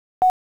name dialler confirm tone; Nokia; 6650 fold AT&T

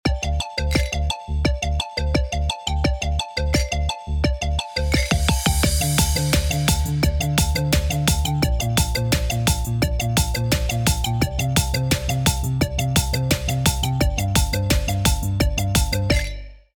Cheerful Time, ringtones; Tecno Pop 5

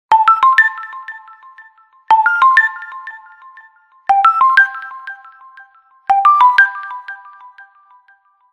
Alarm, Nokia Lumia 1520; Lumia 1520 South Africa

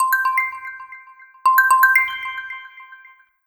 Alarm Xylophone; Vivo; X1; alarms

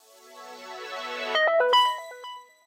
Power On

